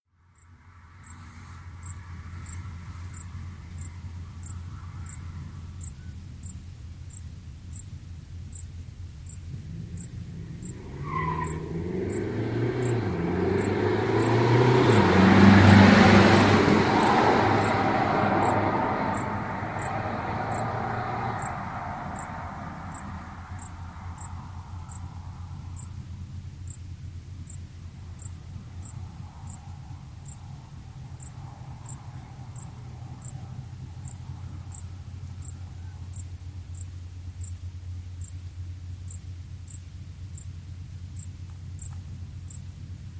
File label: Wild Leigh crickets